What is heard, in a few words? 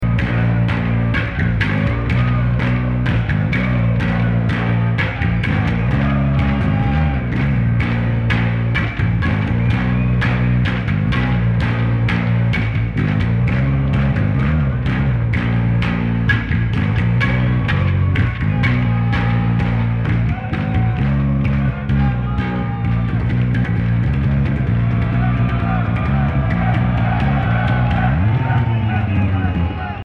Bass Solo